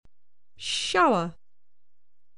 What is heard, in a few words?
shower